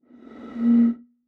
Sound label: Bottle Blown